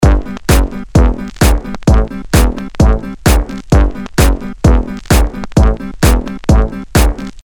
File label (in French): tuba rythm